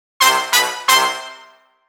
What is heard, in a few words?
Stabb, latin; Brass сэмпл; Latin, Латино; 130 BPM; loop; духовые; samples